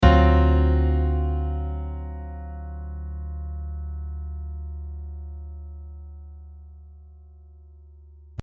D7 Sharp Nine Sound

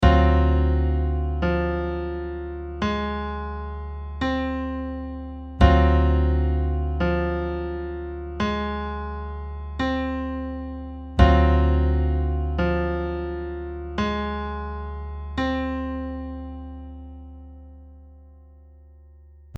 F minor triad over D7 sharp nine

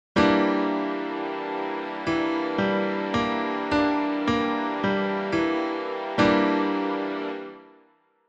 7th chord

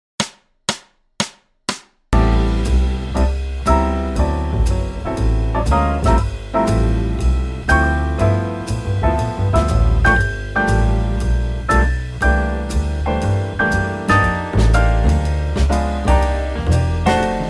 rhythm changes bridge exercise

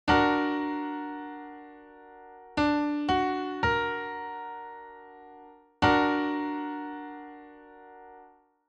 music audit triad

